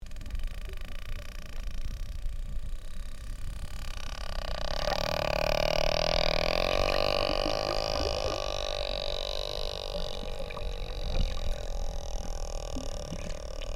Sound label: creak